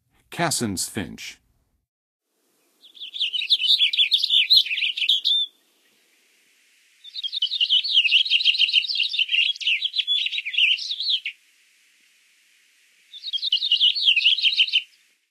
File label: Cassins Finch, Songs of Birds of North America; Western USA; Western USA Songbirds